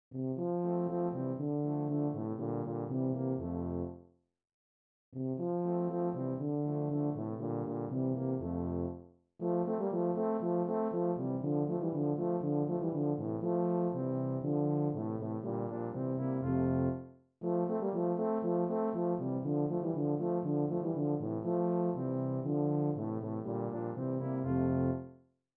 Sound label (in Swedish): F-dur